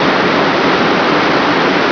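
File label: waterfall; World Racing 2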